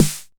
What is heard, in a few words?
909 SD; TR808+909